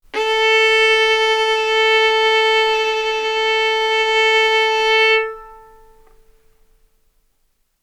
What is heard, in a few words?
Violin arco mf sul A A4 B4 stereo